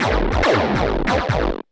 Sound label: Industrial 140